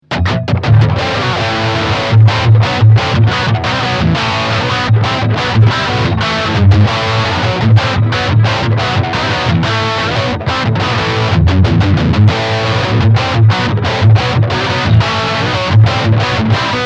Drive

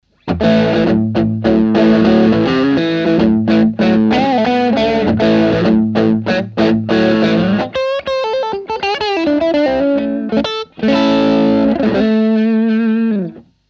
Bridge Neck PU Tube Screamer

